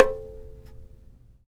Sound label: tar; Roland - LA Composer vol. 3